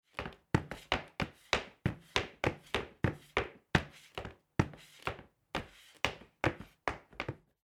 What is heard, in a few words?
Running Down Stairs; audiopostproduction